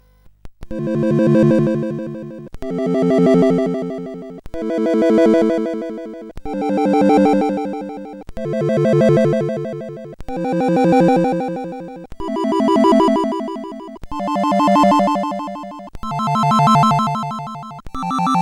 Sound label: Original Mix CSG8580R5